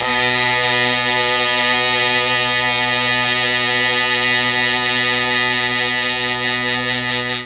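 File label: B1 string brass, mellotron; STRGS+BRASS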